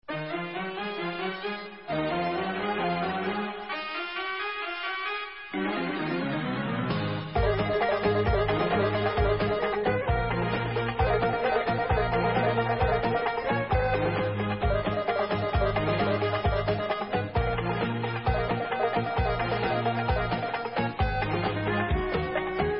Debka Instrumental

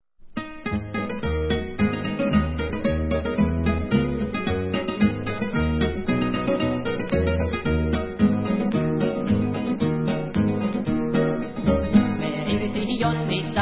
Debka

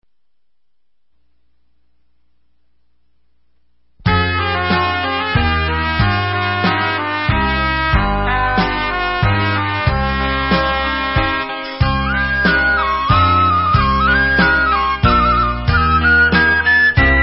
Instrumental

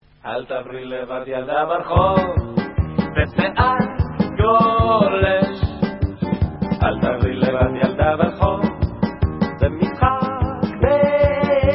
Medley Hora Israelit Folk

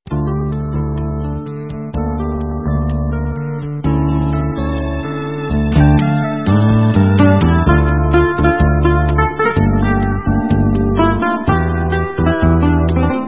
Instrumental

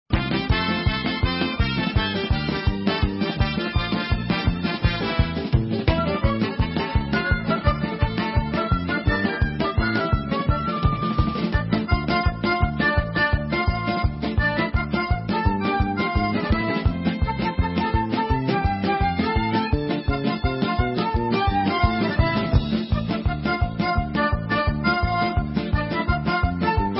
Instrumental

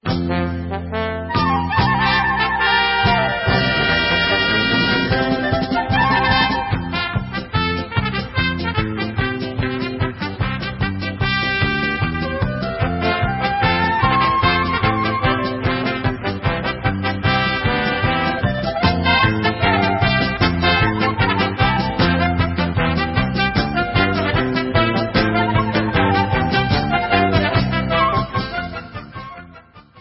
Instrumental

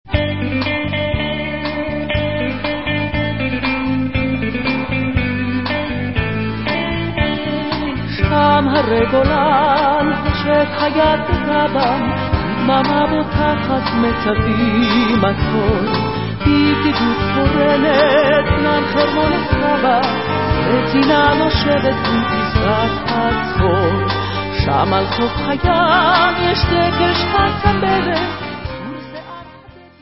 Vocal

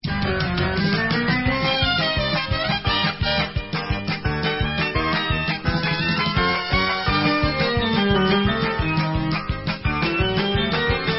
Instrumental

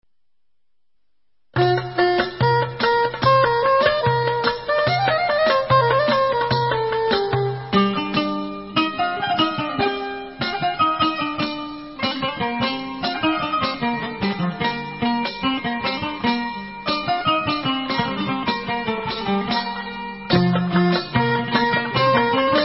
Instrumental

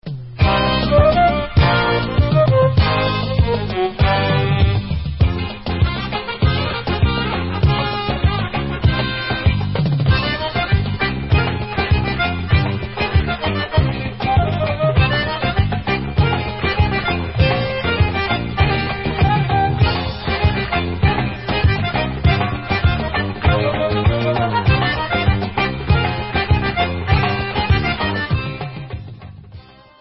Debka Instrumental

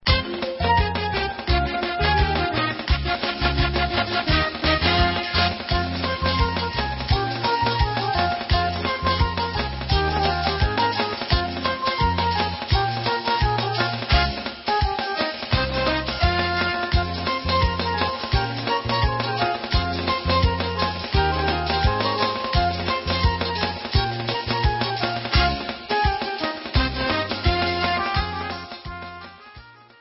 Instrumental

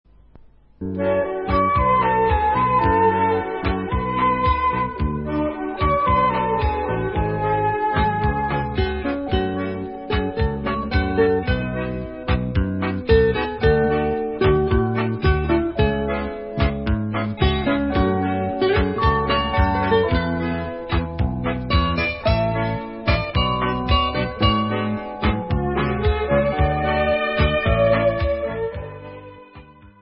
Instrumental

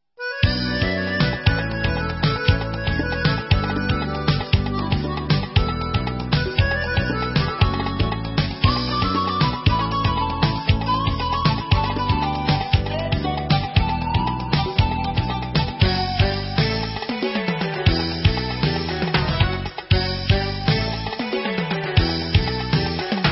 Instrumental

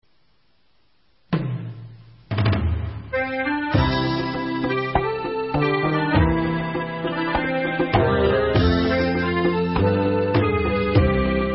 Debka Instrumental

